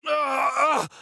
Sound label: AHurt